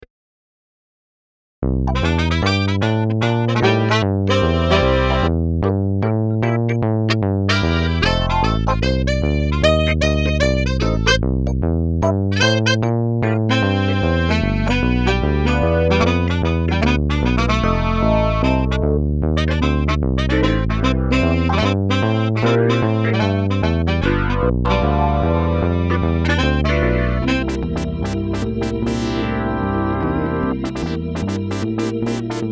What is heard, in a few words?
MIDI blues